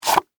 Gun Holster Remove, Gun, Holster, Remove, Handgun, Pistol; Warfare; Pistols; BlastWave